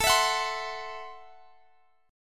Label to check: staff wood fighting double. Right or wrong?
Wrong